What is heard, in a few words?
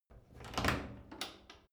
wood door old lock